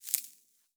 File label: Soft Dry Rustle